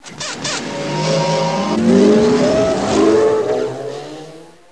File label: startup